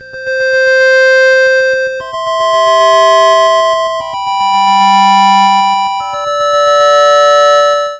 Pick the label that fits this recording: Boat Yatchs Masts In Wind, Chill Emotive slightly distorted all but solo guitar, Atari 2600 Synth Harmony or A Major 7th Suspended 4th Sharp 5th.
Atari 2600 Synth Harmony